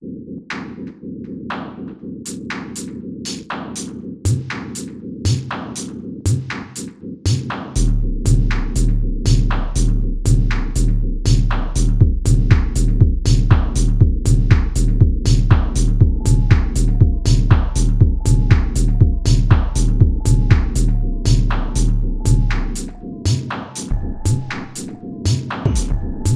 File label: Digitakt Sample; Digitakt Sample Pack 2 Demo